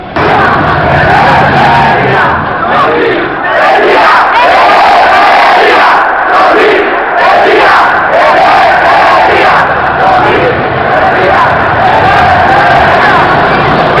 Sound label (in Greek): Συνθήματα; Ψωμί Παιδεία